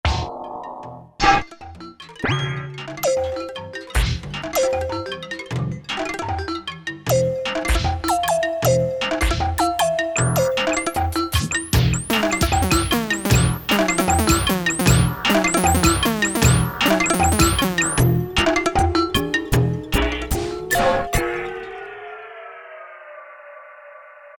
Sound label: Splits, Roland U-220; Factory Patches